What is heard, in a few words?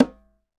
Conga Hi Mt, Roland BK-5 Percussion